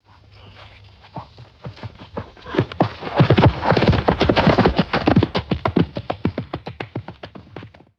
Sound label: horse galloping and jumping on turf; footsteps